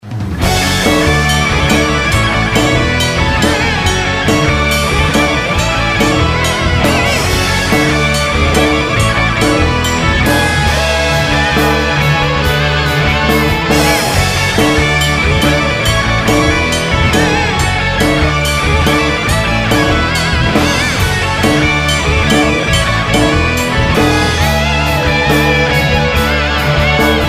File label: intro solo de